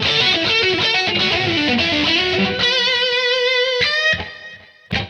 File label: lead 122